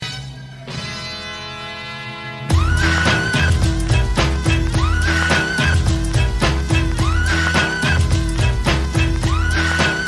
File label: beat